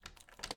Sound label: Key Open; MiSide